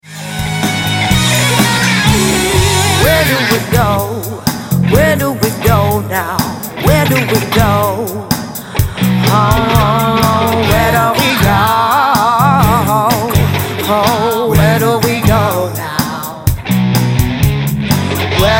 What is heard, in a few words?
Rock Pop Function Band; London